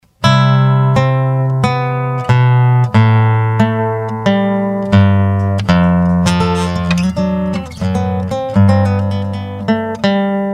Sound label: air on a g string